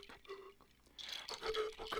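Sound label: Music Village Calm Near; from dust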